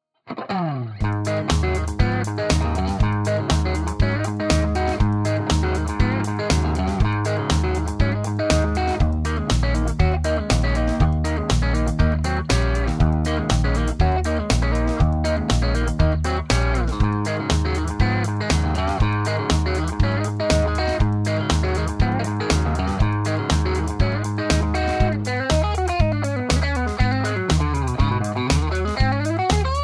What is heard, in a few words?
crunch bridge neck, Tele; schwarzbach; VP-Tele-Pre55